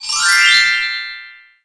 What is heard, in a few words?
balloon pop, pop